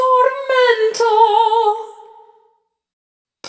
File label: Tormento, vocal samples; Sonic Foundry (Sony Creative Software) - Pocket Diva World Class Vocal Samples; Op-Erratic Loops